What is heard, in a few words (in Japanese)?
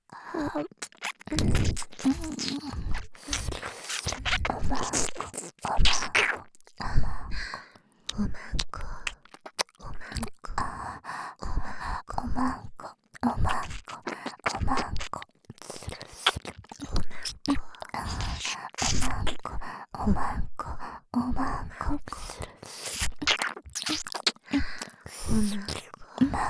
耳舐め; 凪彩と文の淫語ヘヴン